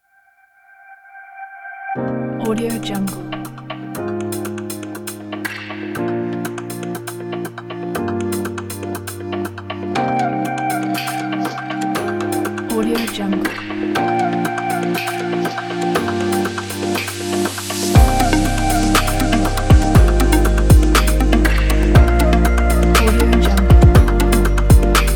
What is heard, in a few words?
inspiring electronic